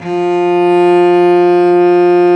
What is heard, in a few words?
STR Vc Arco p